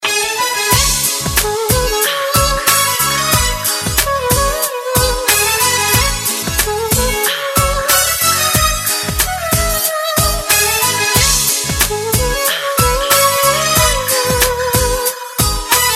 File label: Arabic Tone